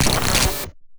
cut; casualtiesunknown

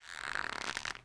stretch; casualtiesunknown

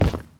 land medium; casualtiesunknown

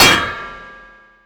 beartrap; casualtiesunknown

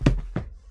body Fall; casualtiesunknown

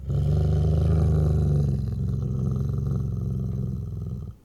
growl; casualtiesunknown

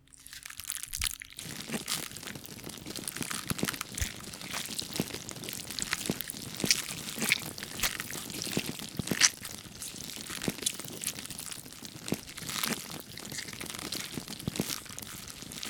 amputation loop; casualtiesunknown

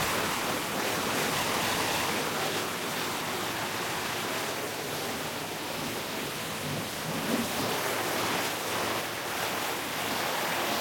jetpack loop; casualtiesunknown

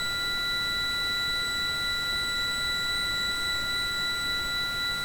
warning loop; casualtiesunknown

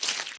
gore; casualtiesunknown

